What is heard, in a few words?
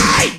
Crazy Japanese Techma Scream Shorter